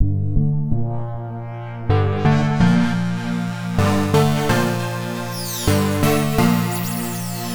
Progresseq, Underground UK House & Electro, Synths; Sonic Foundry (Sony Creative Software)